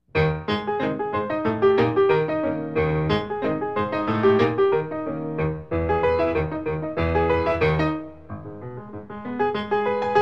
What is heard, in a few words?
rag song